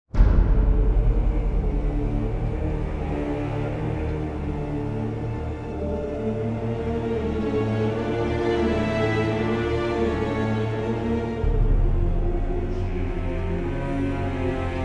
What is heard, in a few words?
DUNGEON MUSIC LOOP; crimefest2015